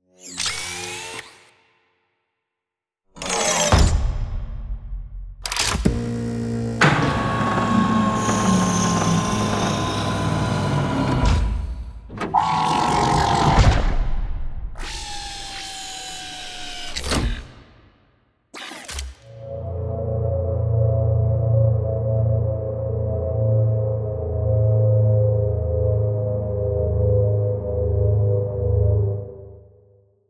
ship colossus closing; Stellaris; machineage

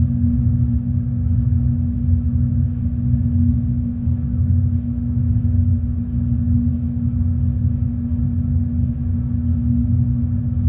amb cargo ship idle; Stellaris; other entities

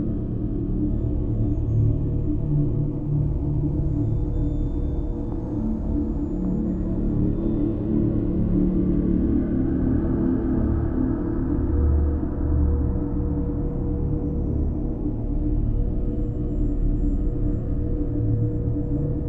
ship cruiser idle; ships; Stellaris; toxoids

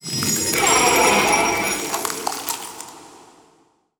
crystal, lithoids; lithoid portrait; Stellaris

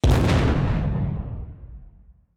kaiju titan hit explosion flash; Stellaris; biogenesis; weapons

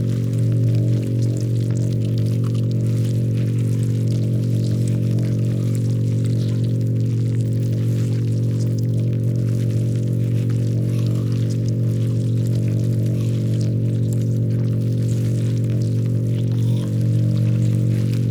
ant ship construction idle; biogenesis

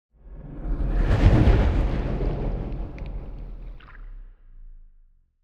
colossus move; aquatics

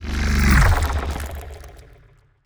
pro ship strikecraft move; biogenesis

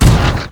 torpedo fire; weapons; Stellaris

